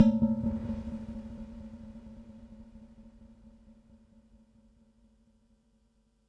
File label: Perc, Percussion and FX; Pearl SC-40